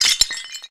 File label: ceramic Break